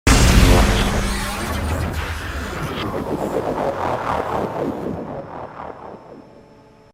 lose

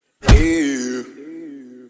euuhhh

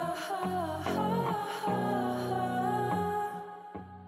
ahaaaaaahaaa

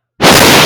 explode

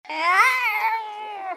roar

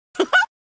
laughter; mickey mouse

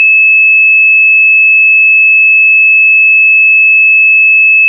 2600 hz tone

